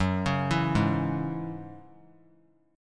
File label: F sharp 7th Suspended 2nd; F#7sus2 arpeggiated